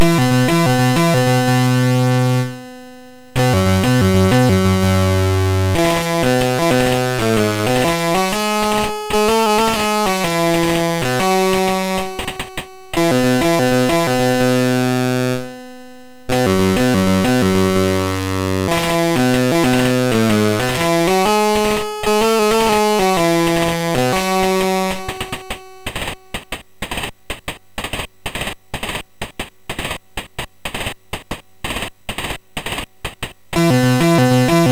MOS6581R3, GAMES; Tank

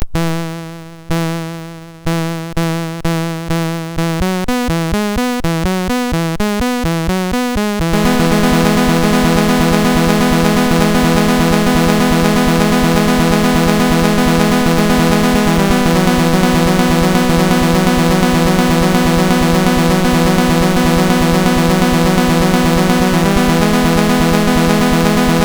Reverb MOS6581R2

